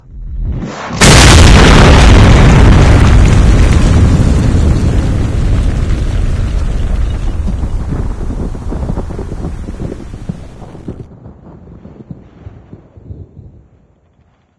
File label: nuke explosion; svencoop